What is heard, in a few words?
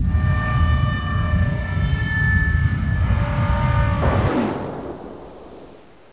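door open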